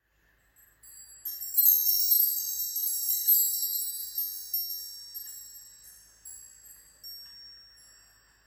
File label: Sparkle